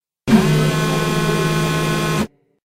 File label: mechanical arm